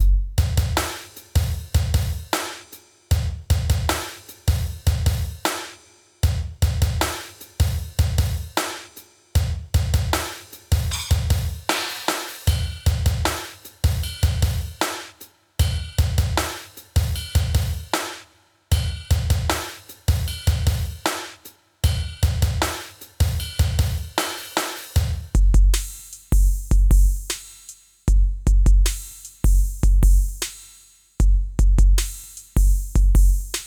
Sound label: music worldmap; galaxy hero android